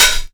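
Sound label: HH open hi-hat, Fresh Disco House; USB Soundscan vol.20 - Fresh Disco House I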